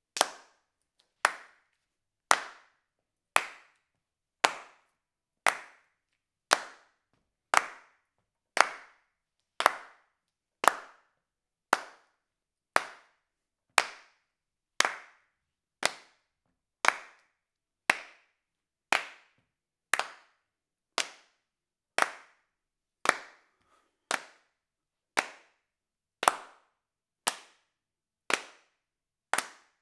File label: Mono Room